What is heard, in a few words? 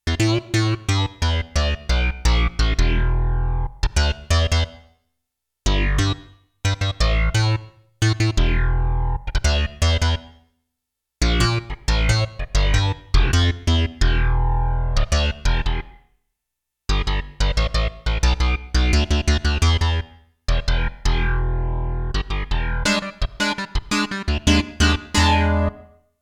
Syn Bass, Roland JX-1; Factory presets; Additional factory patches